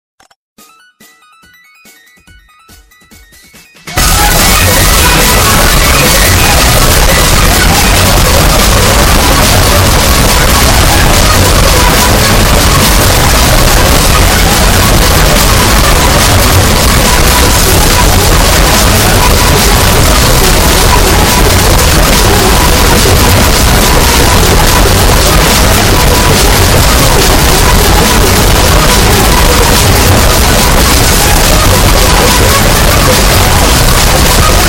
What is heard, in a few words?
earrape